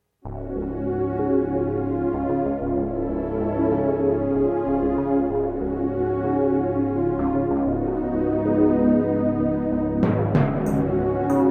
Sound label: Ambient